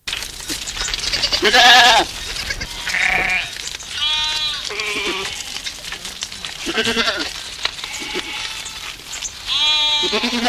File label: Sheep and goats return home; Kenya